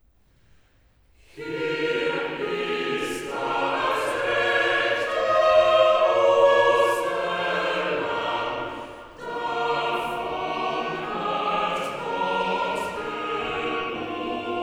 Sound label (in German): Choral; Cantata, BWV 158 'Der Friede sei mit dir'; 'Hier ist das rechte Osterlamm'; Bach; Arnstadt; 1st Sun After Easter